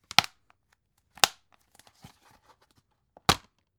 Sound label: dvd case handle open close